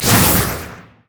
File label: shadow swing; LoL; zed stormninja